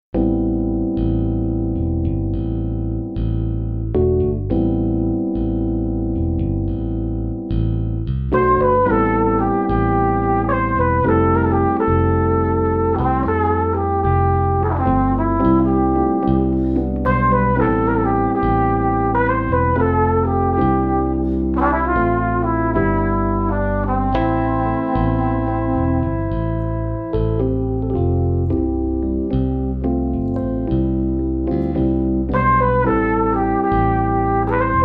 jazz trio

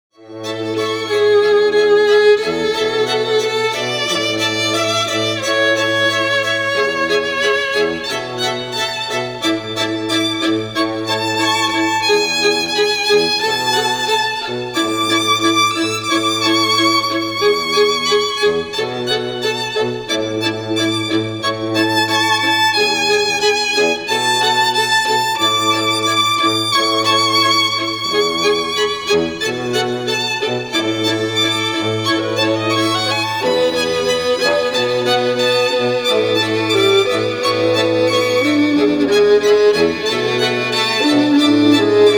Quartet, classical string quartet

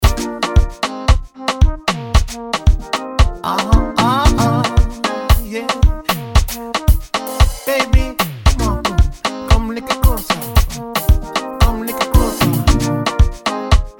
Steel Drum

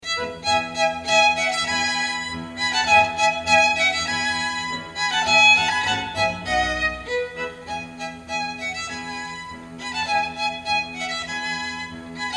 Trio, String Quartet; Vivaldi Spring

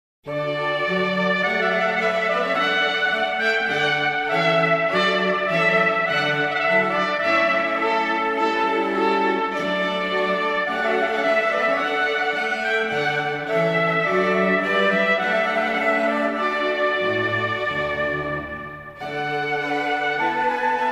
Prince of Denmark March, String Quartet; Clarke

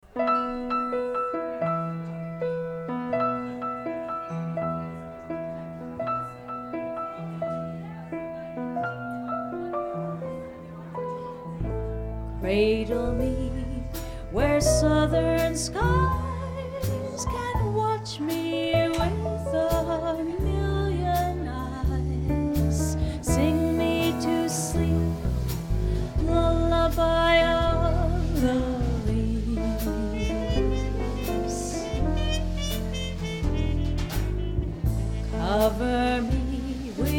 Swing

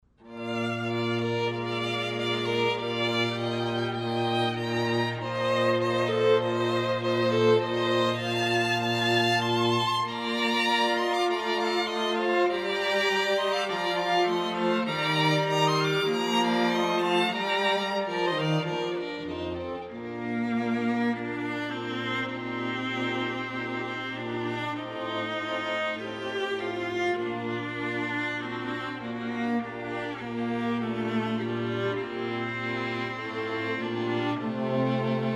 string quartet

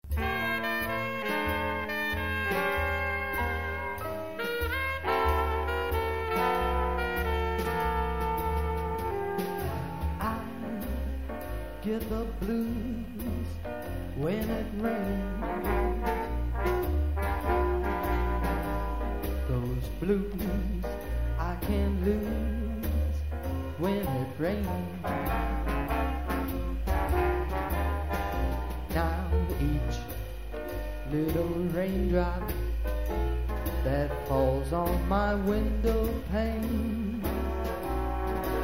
Big Band

